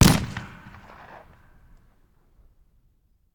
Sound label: Bullet Impact; Sound Ideas; Guns